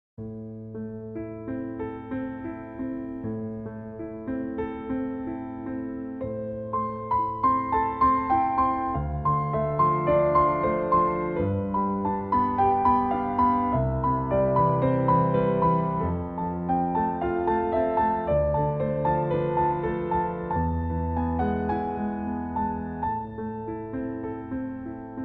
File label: relaxing piano music